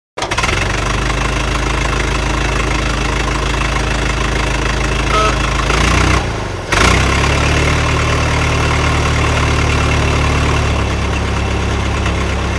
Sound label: Automobile; Start / Away; Model 't' Ford Start Up, Idles And Away.